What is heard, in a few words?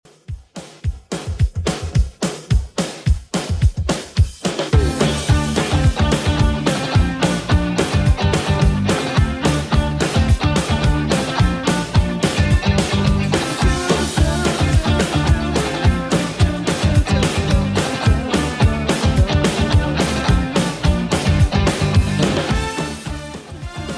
80s 108 bpm; Redrum